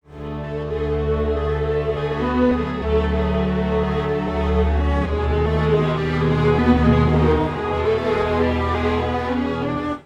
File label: strings; timestretch; celemony melodyne